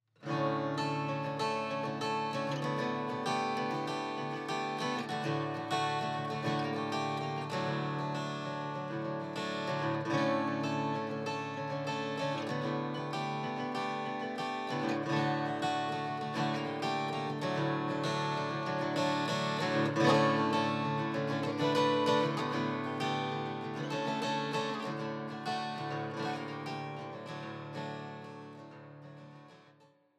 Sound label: 70s acoustic